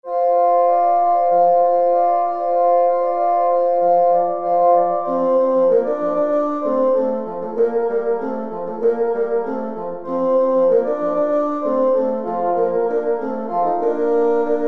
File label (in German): blaeserquintett